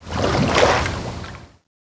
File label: swim little waves